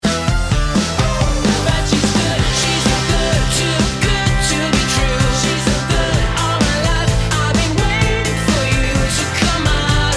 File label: Perky Pop